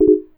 CHORD, Drum & Bass, 130 BPM

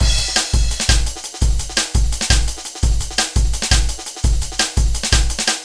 Jazz Break, Drum & Bass; Fluid Dynamics

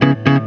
TAR GEL, Roots Disco; USB Soundscan vol.32; AKAI

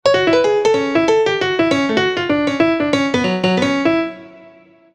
Piano Solo, Cinematic Funk; Sony Creative Software; Urban Tone Poems; Solos

